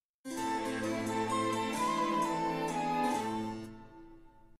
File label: Jingle; HS DxD